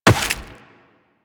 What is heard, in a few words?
Step Rock, Footsteps, Walk; Warframe; Tenno